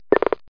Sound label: pulse